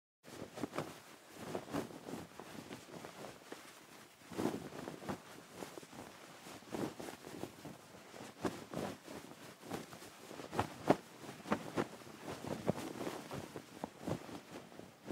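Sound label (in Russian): звук ткани на ветру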